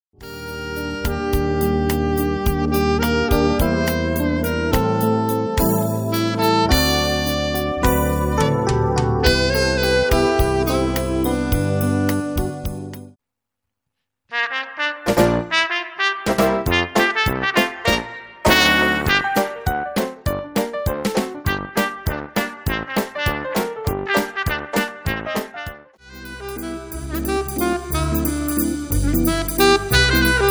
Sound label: Jazz